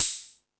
More Cymbals, Drum Machines E-MU Pro-Cussion

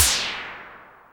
Cymb, Clavia Micro Modular

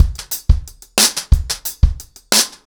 90BPM, acoustic drums, drumloops

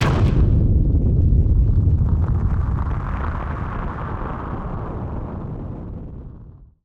Firework FX; musicradar; bomb factory

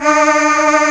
CHOIR D#2; AKAI S-Series CD-ROM Sound Library VOL-2

